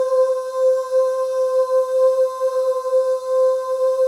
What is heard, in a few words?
BGV OOS; Spectrasonics; Symphony of Voices